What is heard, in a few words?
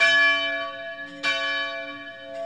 Church Bell